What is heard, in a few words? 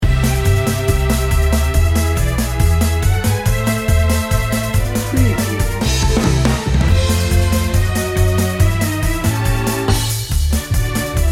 broadway loop